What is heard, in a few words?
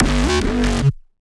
Bass